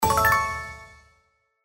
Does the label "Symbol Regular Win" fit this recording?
Yes